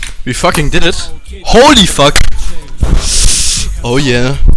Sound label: applause